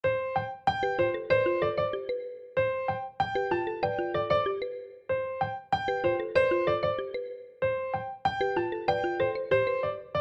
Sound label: xylophone